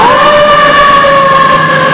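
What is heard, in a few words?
fire siren, transportation; fire srn; Web Clip Empire 150,000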